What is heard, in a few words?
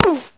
Male Hit By Pitch Baseball